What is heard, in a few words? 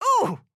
AHurt